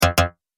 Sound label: Multimedia Double Button; Button Sound Effects